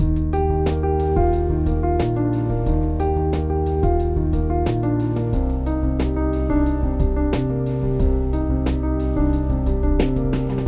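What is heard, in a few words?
loop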